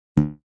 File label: Bass Doing